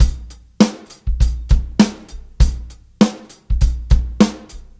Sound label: Live Beats, R&B Drums